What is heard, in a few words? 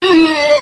"oohyuh", voice; ooh ahh